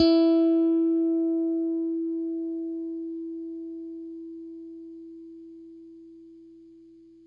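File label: Stratocaster, Guitar; Quantum Leap - Guitar & Bass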